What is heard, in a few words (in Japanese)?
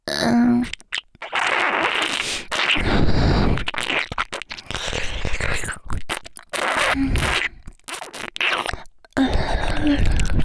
右 耳舐め, ASMR; ギャルフェラッ!!学校は援交ギャル風俗店ッ!!